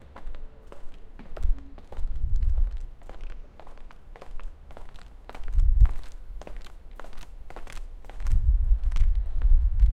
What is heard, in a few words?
man walking in street, pavement